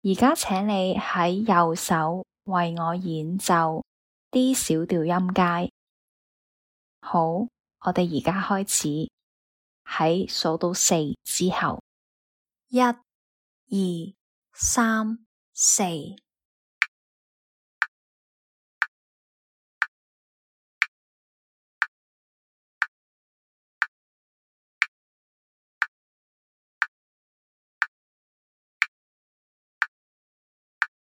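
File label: D minor scale right hand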